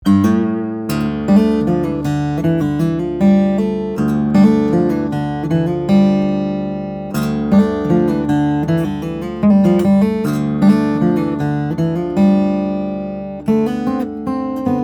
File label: steel string guitars, flattop; Tippin; brazilian rosewood; european moonspruce